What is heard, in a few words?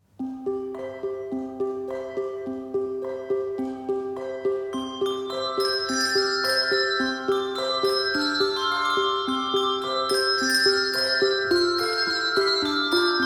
staccato